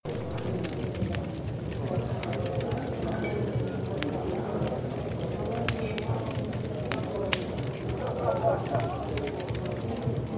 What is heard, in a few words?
Tavern Ambience; PhoenixCraft-1.16.5; ambience music